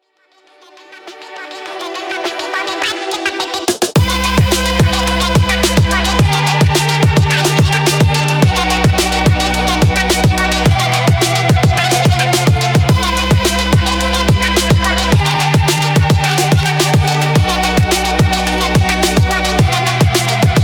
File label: night phonk speed up